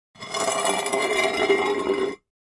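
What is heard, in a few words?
Large Tile Square Scrape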